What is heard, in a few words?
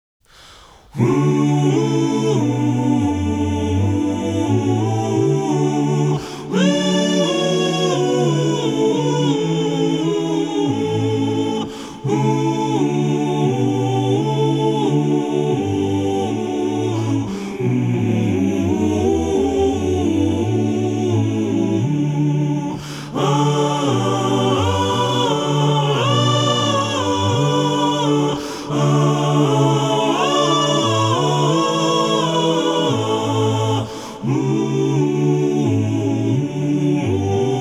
Acappella; Variation on a Theme by Gluck